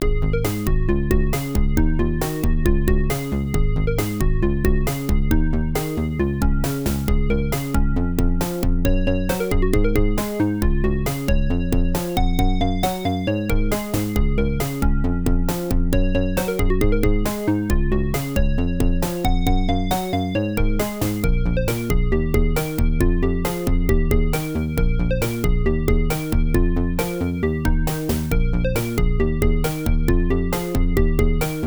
London Bridge Is Falling Down, X68000; lemmings

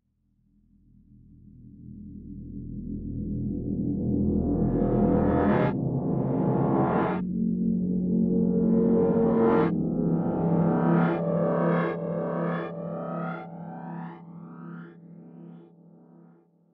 Weird Loop; Insanely Twisted Shadow Planet

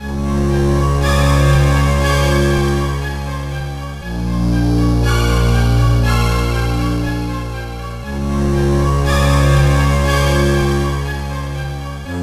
Sub Menu; SpongeBob SquarePants Creature from the Krusty Krab GBA

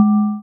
Panel Boarding Start Scan; Among Us

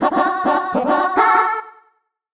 Start Level; Super Mario 64 Restored

